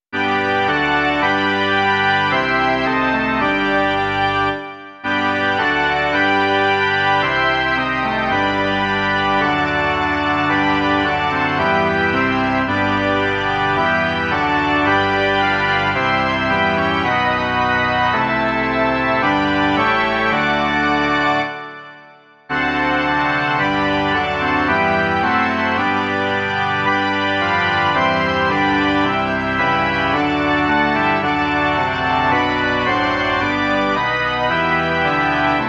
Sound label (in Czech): varhany; Otče náš, kéž dnes dáš